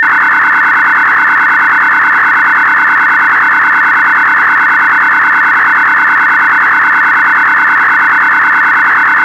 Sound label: mpt1327 cell broadcast